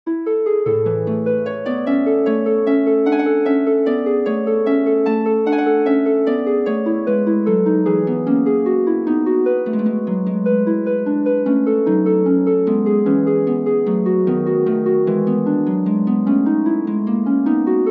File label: for harp